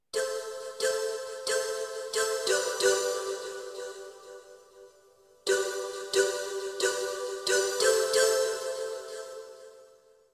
Jingle; MGS1; Konami

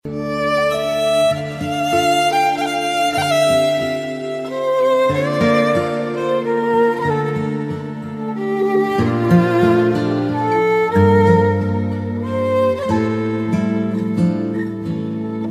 Iphone 4 Violin Ring

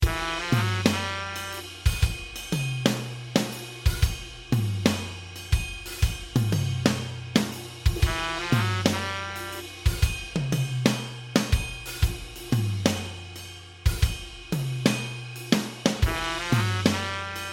Jazz swing

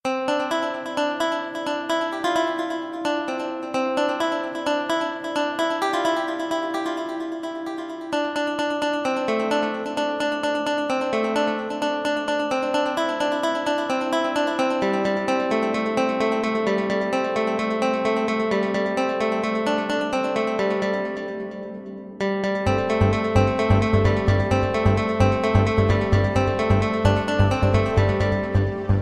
Piano

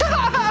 Giggle Water

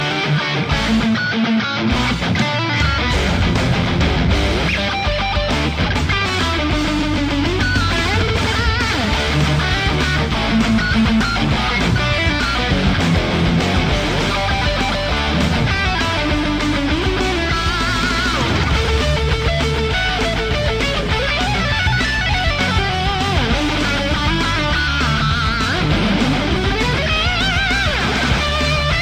Rock

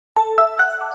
Nokia Mini

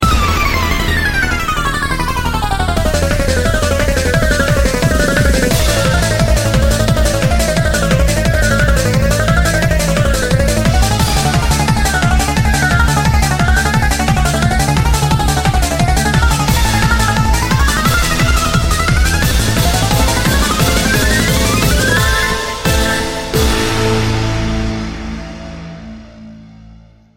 Classical

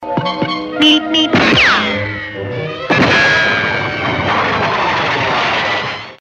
Road Runner Beep

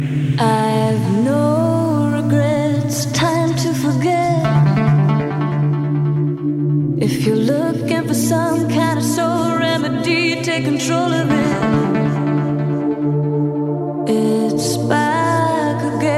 Excellent Trance

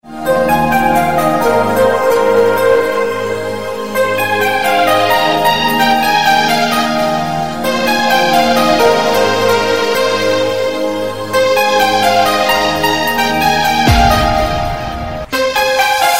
Piano dj

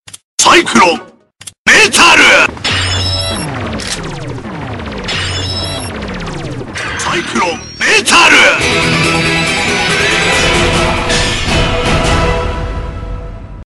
metal

